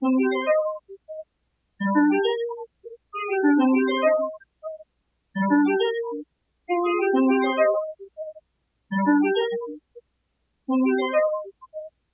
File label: Various Melody Loop - 135 BPM C Min Guitar; Loop Stems; Cymatics; 2020 Melody Collection